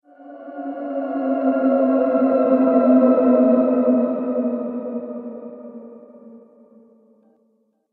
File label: Haunting Horror Element, Ghost; Ghost Sound Effects